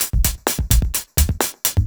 House loops 128BPM; Vengeance Samples

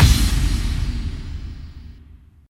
Hits & Explode

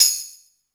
Tamb; Tambourine; Cymbals; Vengeance Samples

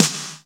Snare; Vengeance Samples

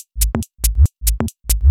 Mini Kits Drums 140BPM

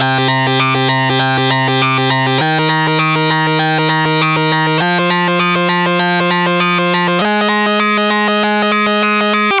ARP Odyssey, Arp A Line, synth samples

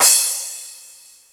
Crash; Vengeance Samples; VEE Cymbals

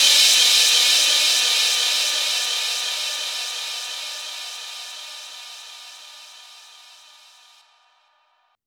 FX Downlifter

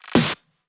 Pre-Shifted Claps; Vengeance Samples

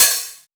Cymbals OH, Open HH; Vengeance Samples

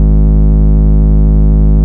Bass, Root G#; Vengeance Samples

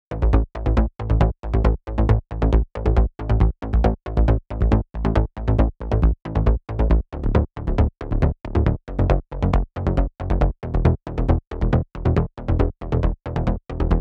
137BPM Bassline, Amajor

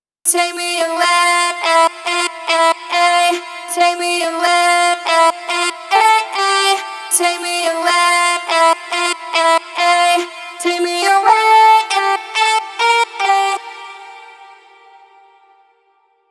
Female Vocals Chorus WET, 140 BPM; Vengeance Samples; VTDS2 Song Kits; Female Take Me Away

